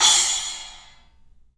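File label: club cymbals; 3D Sound; 3D Drums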